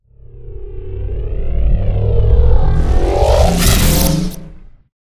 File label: cloak deactivate; Titanfall 2